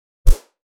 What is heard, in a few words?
weapon swing, sword